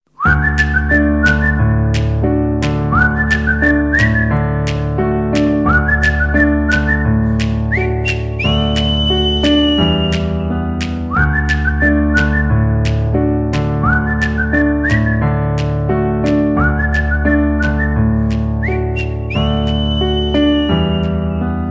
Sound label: whistle melody; alternative